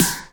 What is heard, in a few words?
Snares & Claps; Vengeance Samples